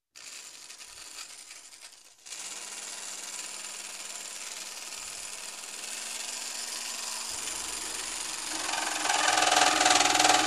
Machinery Pump Belt Noise

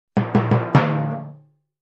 Timpani ending hits

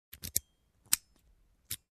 Locking Up

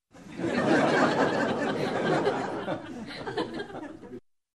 Group of people laughing up-close

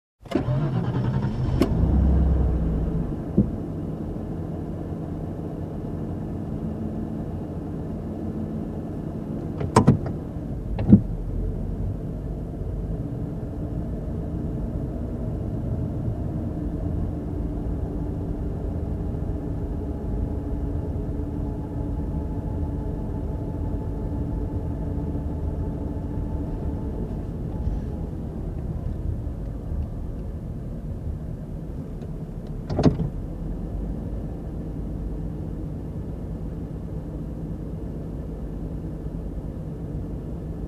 Calm drive in car

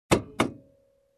Locked Car Door

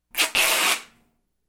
Scotch Tape packing

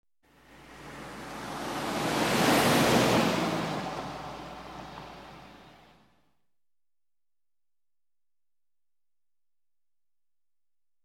Cars passing by on a highway

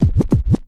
Scratch Kick Drum

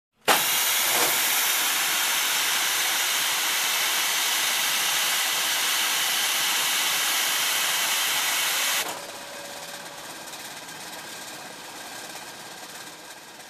Air Pressure Machine turned on and off

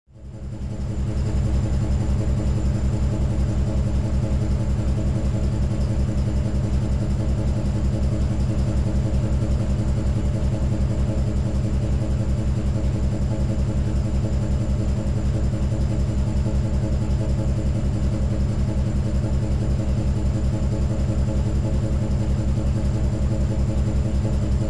Heavy Machine Turbine noise

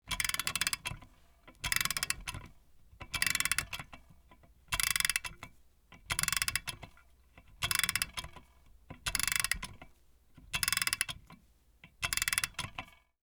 Wall clock gear ticking

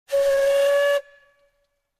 Train Horn whistle

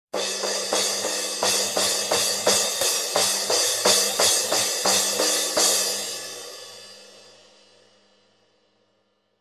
Multiple drum crash hits

